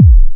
Kick, Drums & Percussion One Shots Drums

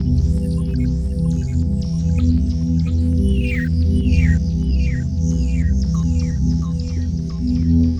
Pad

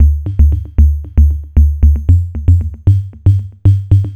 Synth Bass

